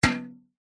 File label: vehicles bicycle pushbike metal mudguard hit impact clunk soft; zapsplat